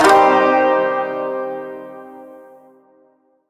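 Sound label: Shawzin Pentatonic Minor Chord B; Chords; Warframe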